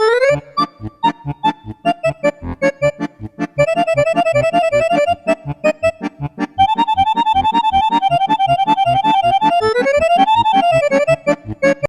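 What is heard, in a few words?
accordion csardas hungarian folk dance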